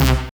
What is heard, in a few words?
Bim Hit; Roland BK-5; Loops & Phrases